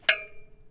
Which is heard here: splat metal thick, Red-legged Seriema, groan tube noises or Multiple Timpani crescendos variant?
splat metal thick